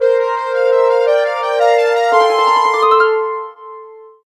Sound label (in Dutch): Trompet; the blob de blob utrecht